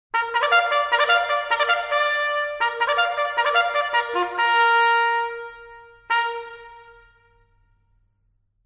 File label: Officers' Call and Stinger, Band; Officers and Colors Center March; Ceremony Music